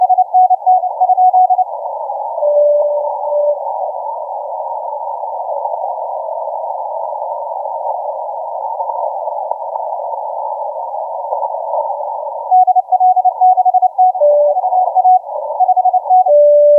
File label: 30m